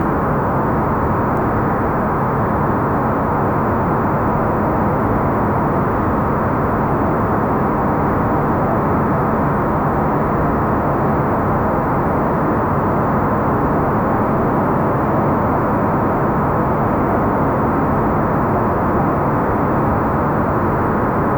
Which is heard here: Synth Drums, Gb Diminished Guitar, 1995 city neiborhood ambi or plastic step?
1995 city neiborhood ambi